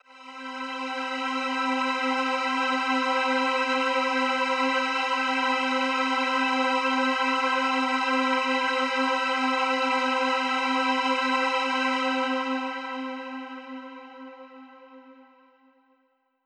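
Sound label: Mach String C major 7, synth orchestra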